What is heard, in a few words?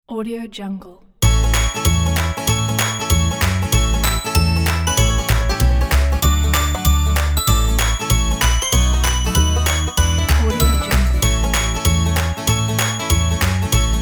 jingle jangle bells ident